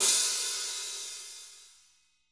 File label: hat, drum